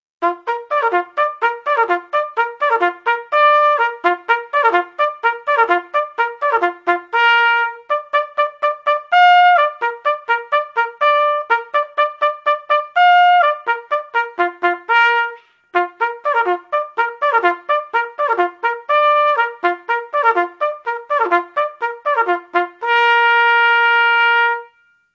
The Reveille, bugle

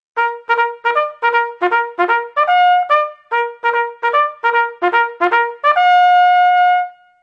Officers Call, bugle

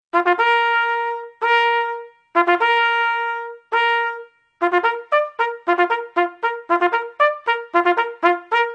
Sick Call

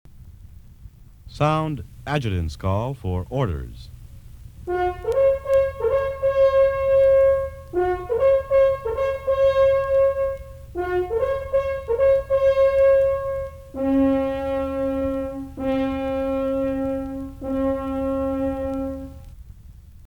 Adjutants Call for Orders Bugle

